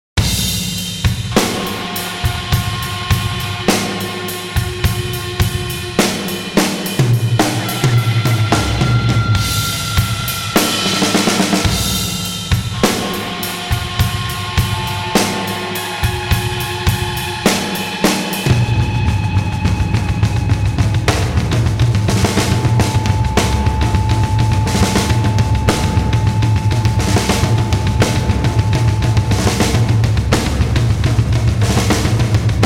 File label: drum solo